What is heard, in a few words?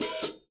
ROCK KIT, Real Drum Kit